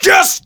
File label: Vocal Oneshots; Vengeance Samples; Vocals